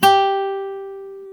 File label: GTR Nylon, Roland; NylonMartin; Club-50 - Foundations